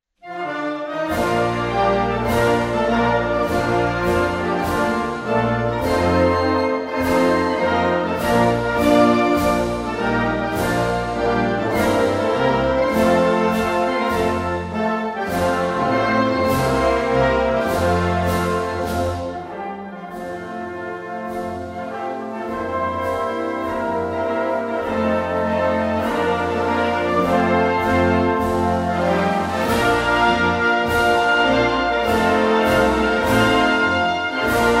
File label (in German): Nationalhymne Belgien